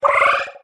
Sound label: idle, headcrab reviver